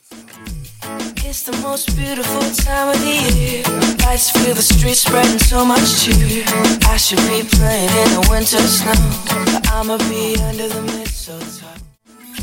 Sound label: Reggaeton Megamix